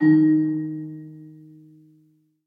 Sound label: melody Eb3; fairymirror